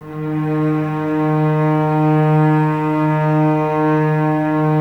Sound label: Cellos D#3, String Ensembles, No Vib; Miroslav Vitous